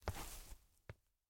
Ladder Up; MiSide

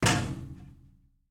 Flash Hand; Game rip; MiSide

